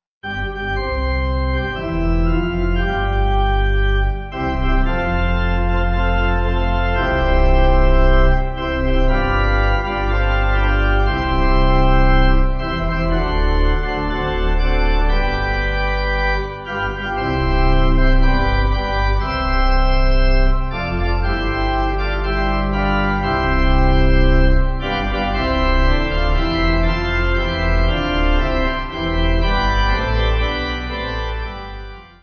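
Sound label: Pipe Organ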